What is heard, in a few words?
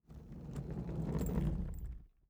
Household Bag Luggage Bag Rolling On Cement